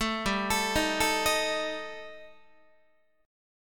G Suspended 2nd Sharp 5th G, Guitar, 4A, 4E, 5A, 5D, 5G sharp, 6C sharp; arpeggiated; 12,15,12,13,13,14